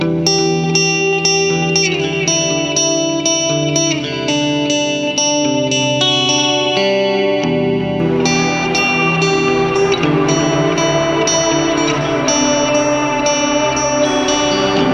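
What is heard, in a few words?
ambient guitar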